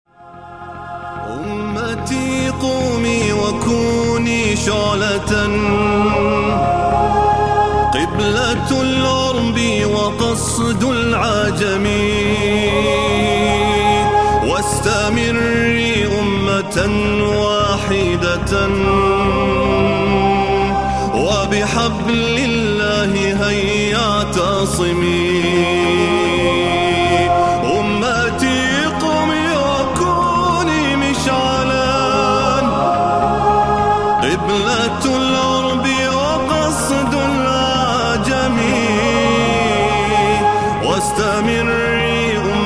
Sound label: ringtone